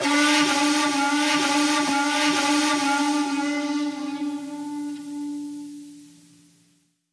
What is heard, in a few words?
128 Flute Delays